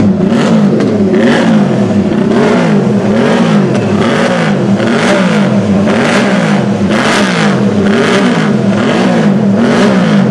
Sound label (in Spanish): grabacion audio motos 500